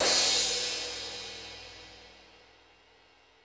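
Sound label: room cymbal crash; Crypton - DrumBase5000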